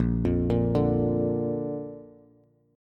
Bb Major, Bass; Bb arpeggiated; JGuitar

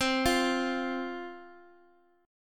F 5th C, chord

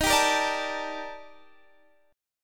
D sharp Major Sharp 11th; D#M#11; strummed; x,18,17,15,15,17; chord shape

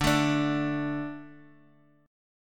D 5th, Guitar Drop D

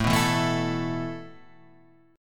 E Suspended 4th A

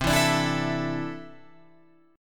C 6th Add 9th C down Guitar Open F; C6add9 strummed

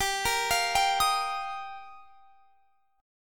G Minor 7th Sharp 5th; Gm7#5; arpeggiated; x,22,20,22,20,23

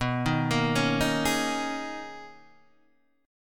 B Major 13th B down Guitar Open D

